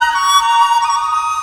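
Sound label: Chinese FLUTE; Soundscan vol.60; Asian Traditions; AKAI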